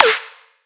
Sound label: Snare